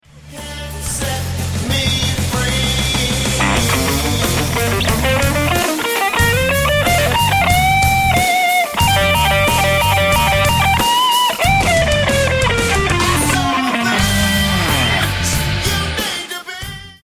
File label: Solo, guitar